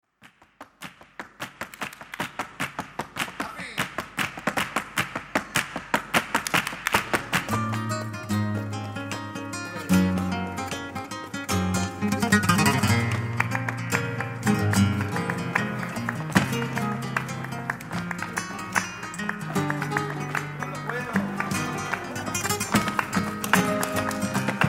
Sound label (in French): le flamenco